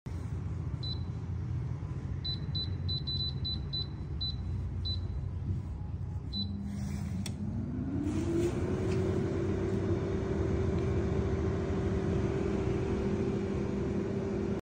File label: air fryer